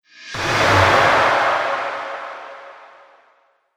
Cinematic Strike Monster Appears, trailer sound effects